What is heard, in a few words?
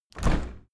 window handle open hard; objekte; fenster